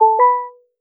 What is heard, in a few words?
engage; IQ.Pilot; selfdrive